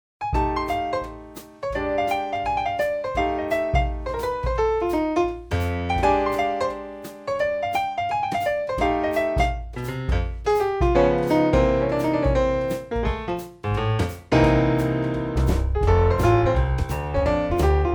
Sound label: boogie blues